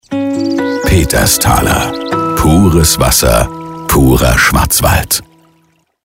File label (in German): Sound Logo; Audio Branding – Akustische Markenführung – Sound Logo – Audio Logo; Peterstaler